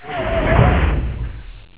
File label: Death Coil